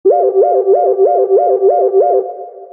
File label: sms